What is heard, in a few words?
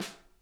snare, drum kits